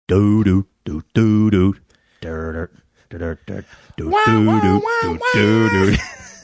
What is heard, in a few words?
singing bass line jumps in other sounds